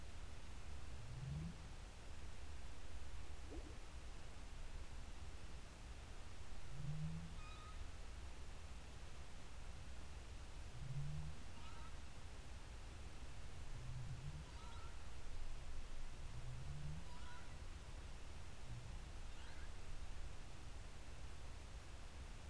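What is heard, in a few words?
hydrophone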